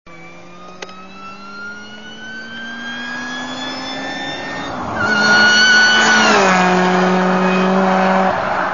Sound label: supercharger